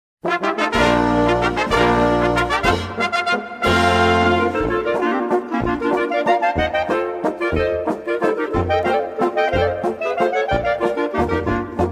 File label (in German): Klarinetten Landler